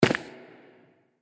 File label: player walk stone underground loop; footsteps